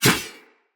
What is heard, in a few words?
Sphere Dual Sword Whoosh; Weapons; Player; Swords